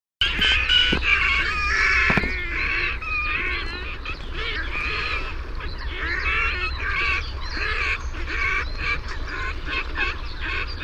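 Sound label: red-necked grebe voice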